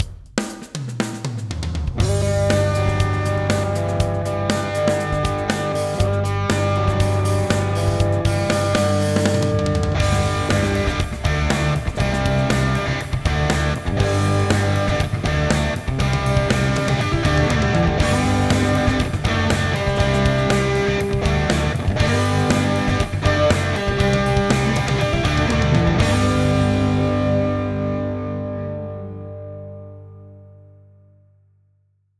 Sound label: tonex crunch